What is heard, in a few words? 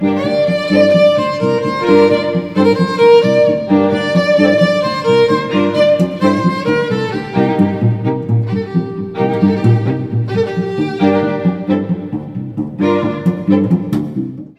Quartet